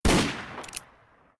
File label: fire, gun; minecraft; starlike